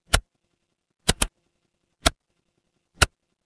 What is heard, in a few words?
mechanical switch